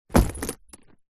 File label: shoes leather business womans throw down drop carpet; foley